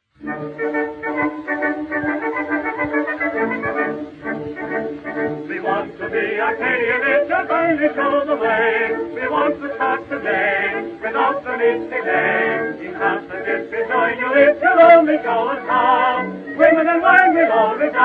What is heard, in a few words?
act III opening chorus; arcadians